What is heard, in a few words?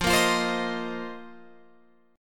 F 7th Suspended 4th F down Guitar Open F